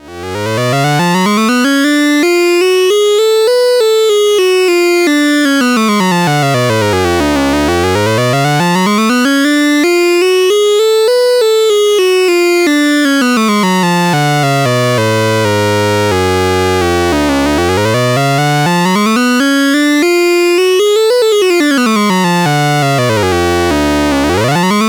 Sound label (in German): Klavis Module Quantize Tri Ueber Waveshaper